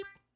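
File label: Hohner Special20 vibrato release G4, harmonica; Aerophones; Releases; Free Aerophones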